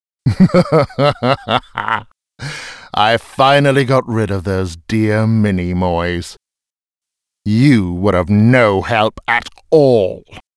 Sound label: Outro Fail US; Arthur and the Revenge of Maltazard; Wii